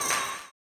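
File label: tambourine, 160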